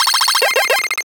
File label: 8 Bit Error; Riddim; One-Shots; SFX; Ghosthack; Riddim Essentials Volume 2